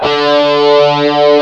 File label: GUIT D3; AKAI S-Series CD-ROM Sound Library VOL-2